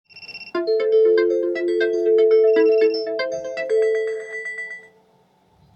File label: alarm clock